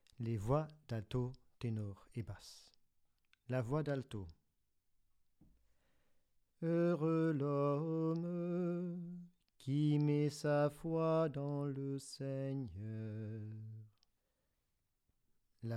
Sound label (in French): des 3 voix Antiennes Heureux l'homme